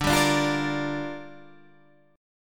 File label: Bb 6th D